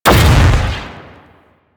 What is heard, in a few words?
Sapient Pistol Explosive Impact; Warframe